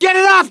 scream while pounced; survivor; gambler

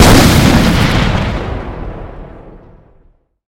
grenade launcher explode; weapons, grenadefire

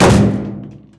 metal barrel impact hard; physics

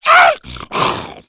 pounce miss; hunter

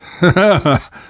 laughter; namvet; Left 4 Dead 2; left4dead2 dlc2

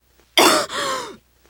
cough, teengirl

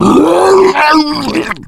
male boomer vomit; voice

